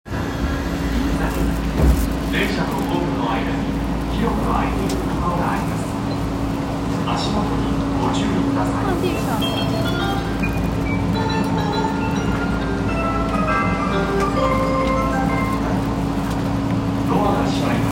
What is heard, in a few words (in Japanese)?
銀座線銀座東, train sounds